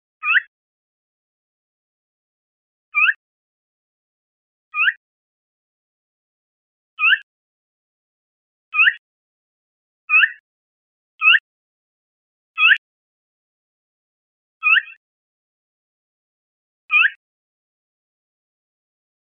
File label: CALLS, birdvocals